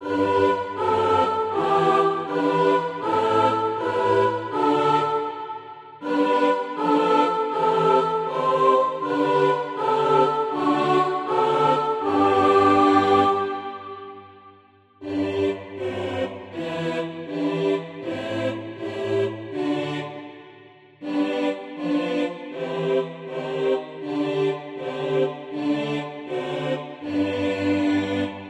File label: sopran